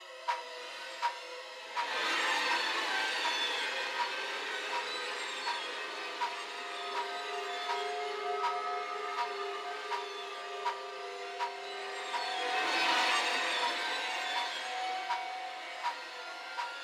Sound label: Suspense, 81bpm, Classic Horror Sci-Fi Music; Dead Ark